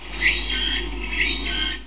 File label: cicada